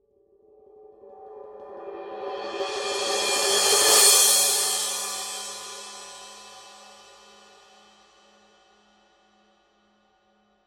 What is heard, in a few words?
Paiste 2002 20 power crash roll